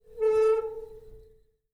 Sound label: SQUEAK Metal Hinge mono; Phasmophobia